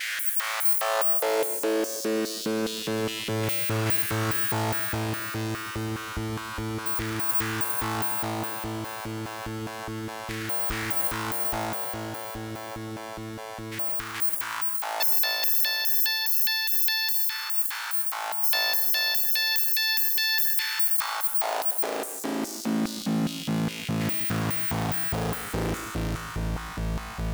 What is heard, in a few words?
SYNTH